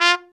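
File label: BRA TRUMP, trumpet, brass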